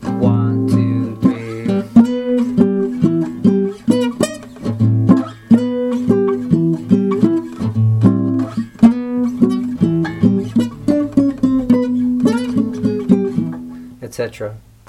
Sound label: jazz licks